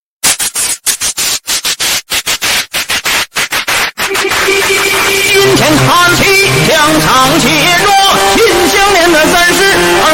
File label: chinese rap; memes